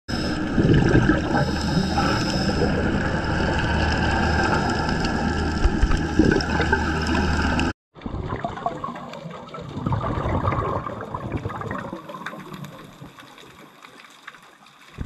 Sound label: diver